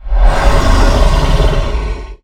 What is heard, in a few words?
Zombie